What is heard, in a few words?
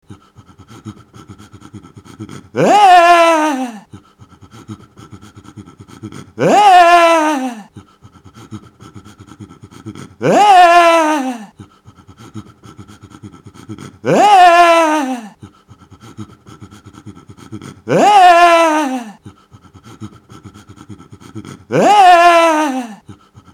hhheuhheuheuhaaaaaaha